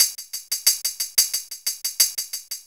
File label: Hattentamb, Synchro Funk, Beats; Sonic Foundry (Sony Creative Software)